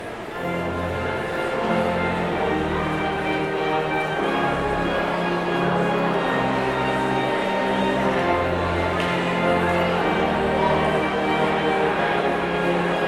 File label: Postlude; Beach Spring